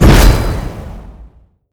Explosion; MG Renewal; ChaosBlade

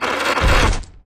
uebeast death; Akeron

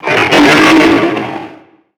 Ice Walker attack

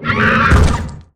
undine death; Akeron

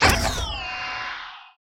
rabbit death; MUDevs S20.1-3

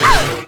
hit; CoreMagriffi

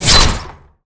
attack; Ercanne

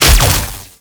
Hit; Kundun; DragonViolent

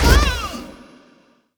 magician hit; Scorched Canyon

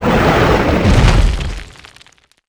Swampmon death; Swamp Of Darkness

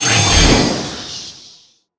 Fierce Attack start; MUDevs S20.1-3

